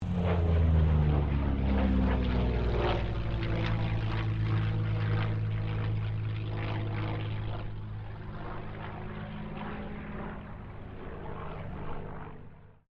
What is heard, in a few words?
AIRCRAFT P-40 KITTYHAWK PASS STEREO